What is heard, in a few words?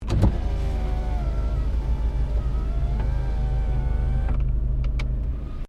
Car Toyota Starlet Window Open; Toyota hire car in Japan; Cars; Vehicles